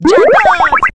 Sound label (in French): bonus son